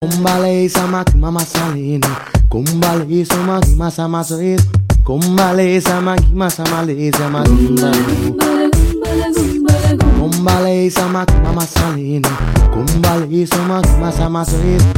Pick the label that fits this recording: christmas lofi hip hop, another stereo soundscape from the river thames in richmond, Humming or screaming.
Humming